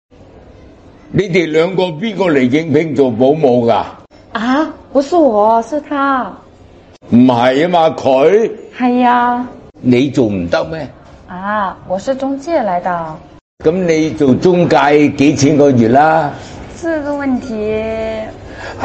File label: laugh; breadradio